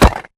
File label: bullet light ballistic concrete close; Titanfall 2